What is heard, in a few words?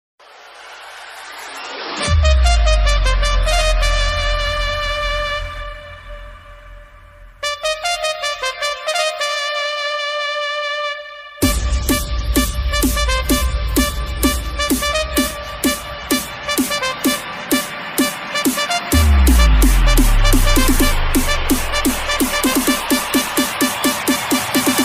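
new ipl dj remix flute ringtone; vivo ipl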